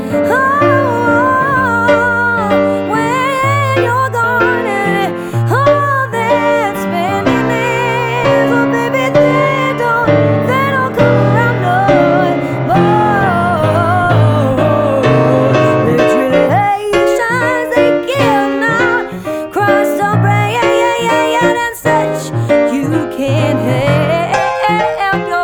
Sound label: Funky, vocal